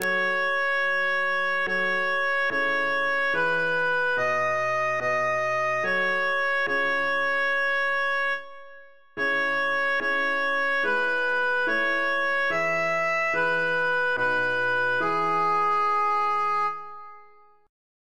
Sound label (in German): sopran